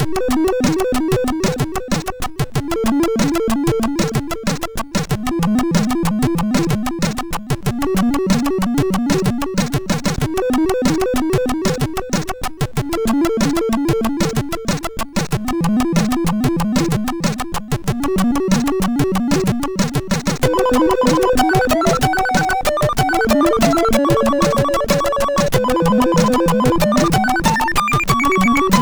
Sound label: No Bass, sid MOS6581R2